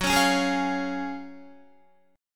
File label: F sharp Major 7th Suspended 2nd, Guitar; strummed; JGuitar